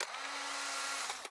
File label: Mounted-Camera Photo Print; viewfinder